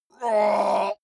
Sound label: male groan strain